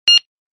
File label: scanner